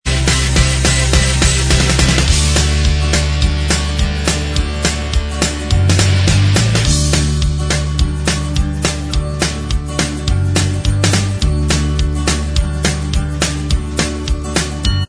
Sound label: tv theme Inst Track